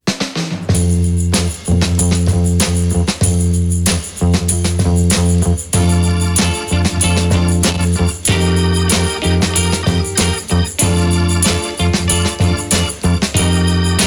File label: Instrumental